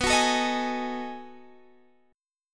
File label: B 13th B, chord